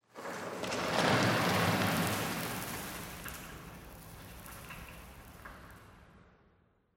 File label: tenement concrete debris; ambient, rocks